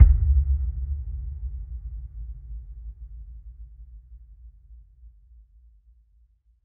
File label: HIT NOISE